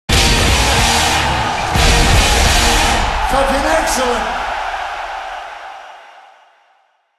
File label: outro Free Spin